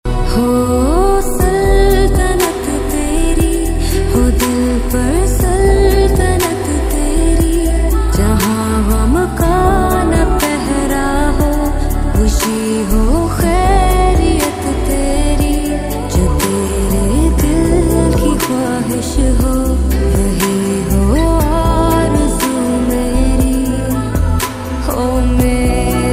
female version, ringtone

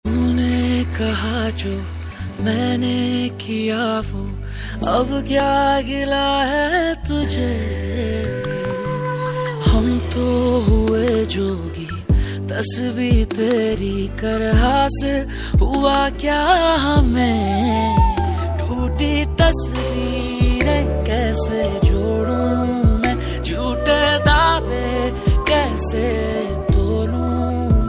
pakistani ringtone

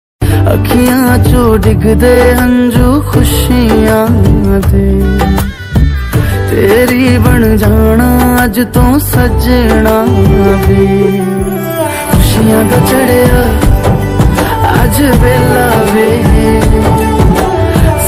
gujarati ringtone

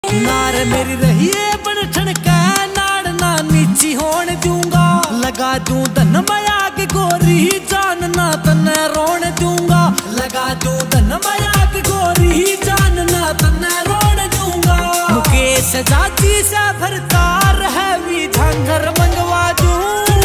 haryanvi ringtone

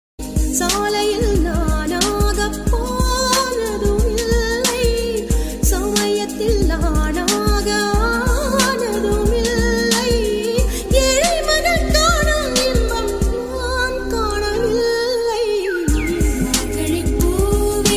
Tamil ringtone

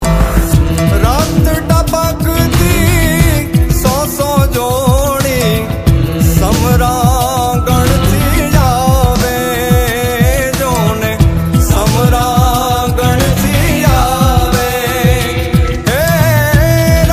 gujarati ringtone

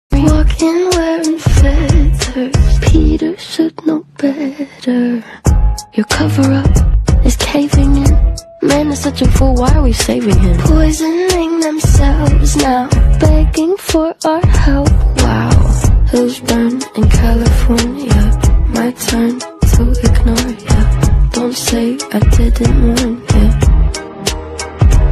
ringtone

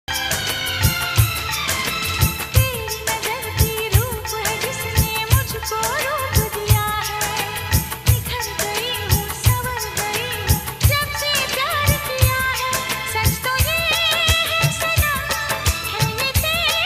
hindi ringtone

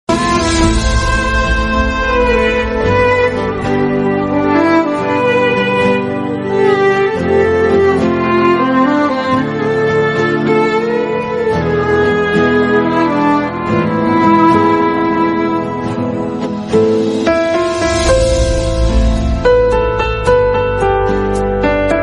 Instrumental ringtone

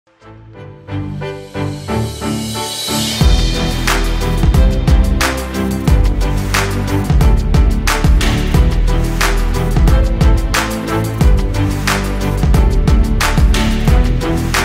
Instrumental BGM ringtone

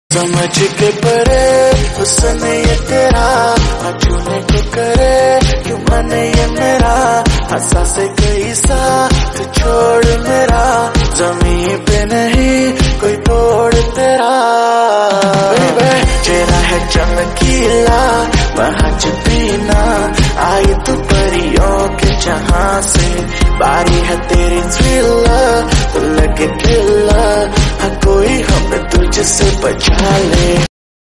hindi rap ringtone

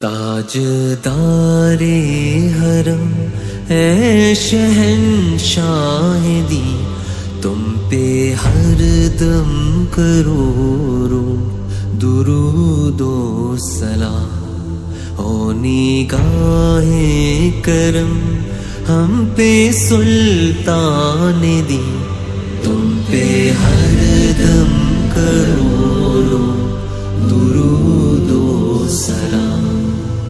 slowed islamic ringtone

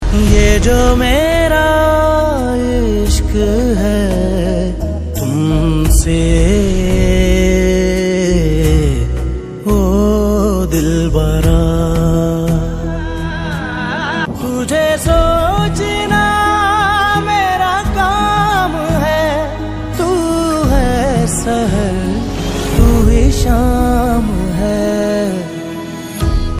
OST Pakistani ringtone

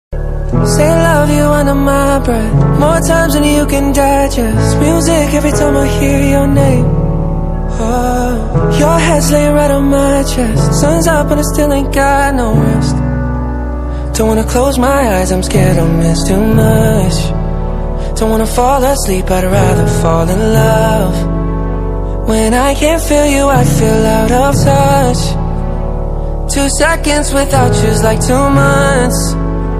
english ringtone

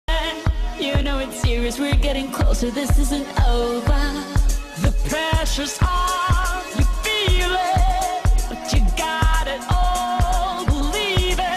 english ringtone

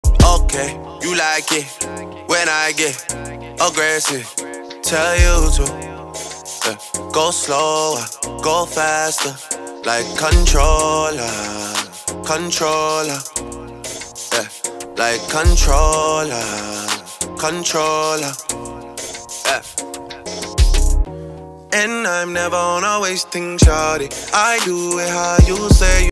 hindi rap ringtone

